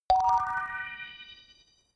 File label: notification kalimba magic high